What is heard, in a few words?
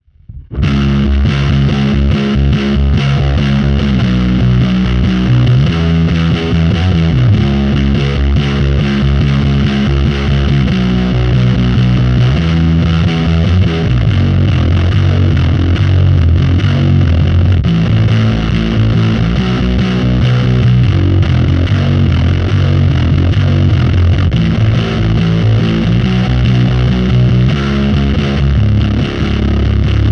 BASS FLAT BOOST